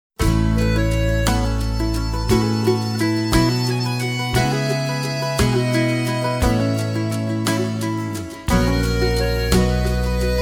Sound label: Waltz